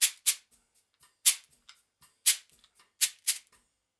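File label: shaker, Percussion Loops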